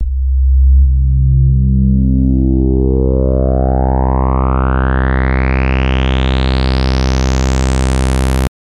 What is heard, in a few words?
CHRACTER FAT; Redshift 6